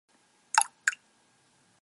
bubble notification sound; samsung

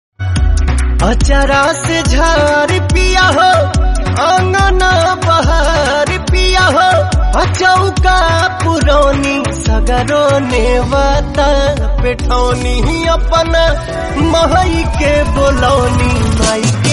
Bhojpuri song

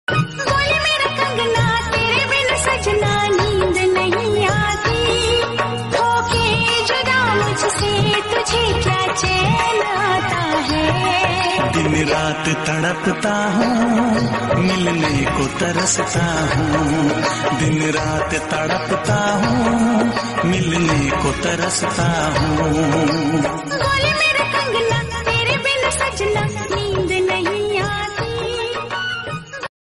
bandish hindi old song

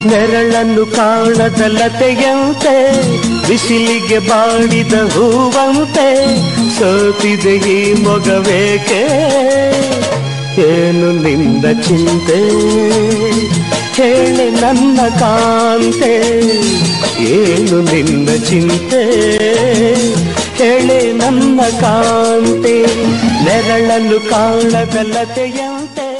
kannada song

